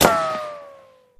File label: Bullet Ricco Single